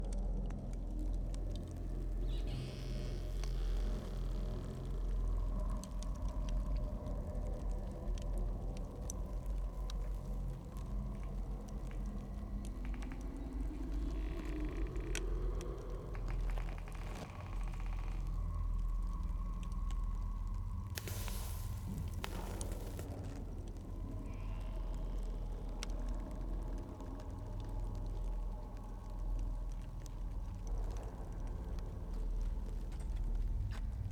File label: amb charred forest; spirit blossom